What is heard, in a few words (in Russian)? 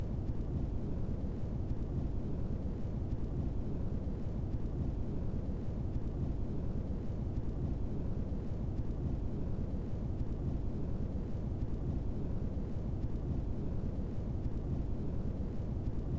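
винил механический; 2000 izotopvinil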